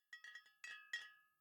Bottle Shake; The Town

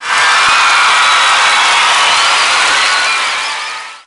crowd cheer loud; Dance Dance Revolution Extreme; PS2; Gameplay Comment Hot

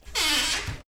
open mine door lock; through abandoned the underground city 2015 gamerip pc macos

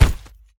enemy death land hip

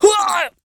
Death; VIP; BigSnake

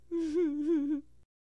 Massage; Bathroom; Hilzartov; Fairy Size Doesn't Matter

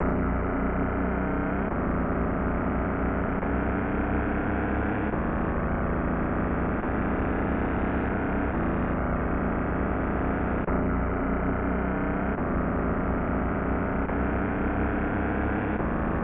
Car Travel; Vegas Stakes

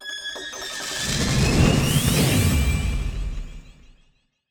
Hero Promote; portal quest android

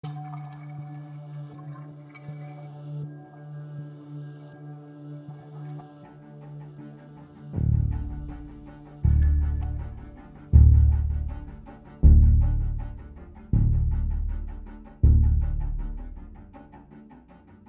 Stealth (Loop)

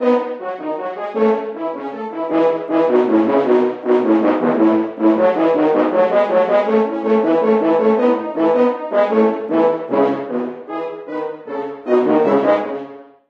Horn; The Bard's Tale Remastered Trilogy

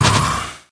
sword

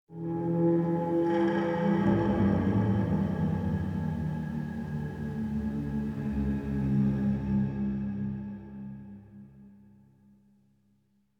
Door Opens; Storytime Girl

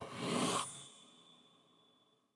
map events open

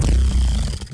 down test

